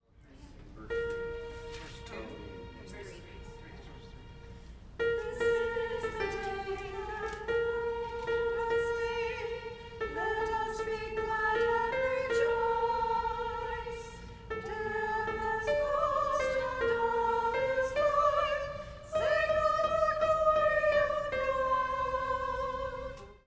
descant; Gather Hymnal

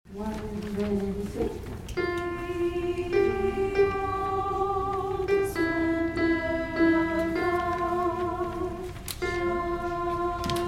Transfiguration alto

